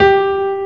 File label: piano g5